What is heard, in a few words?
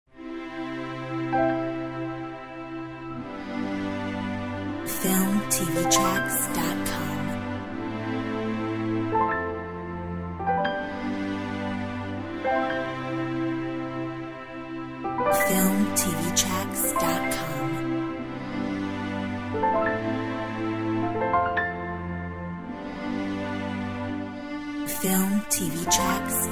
divertimento